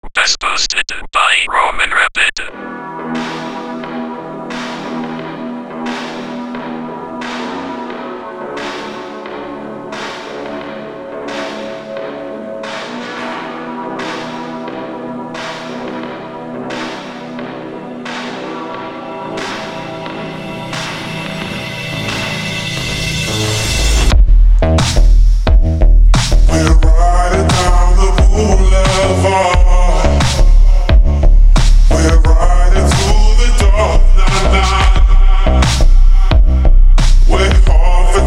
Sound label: BassBoo